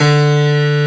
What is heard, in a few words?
Big Band Fast; Roland L-CDC-01